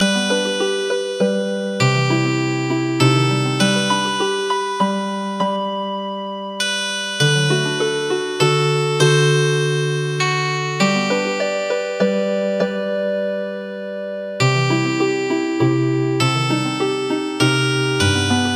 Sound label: Healin Harp